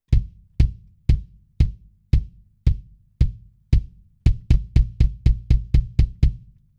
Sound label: kd jazz